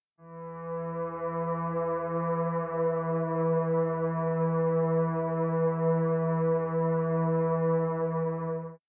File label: delay pad; aas objeq